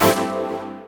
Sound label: Dance Mega Synth; Best Service; 90 s Sample CDs